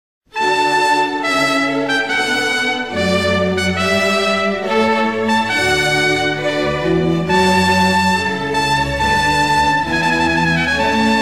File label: Trumpet ouverture; Henry Purcell